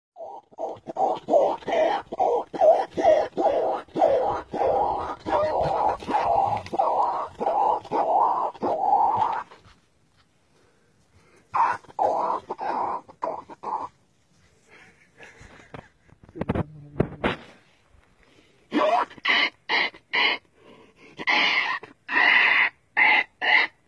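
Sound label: peccary sounds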